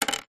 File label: office pencil small down on wood surface